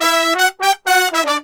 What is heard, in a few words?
120 EM R, BRASS SALSA; Best Service ProSamples vol.14; AKAI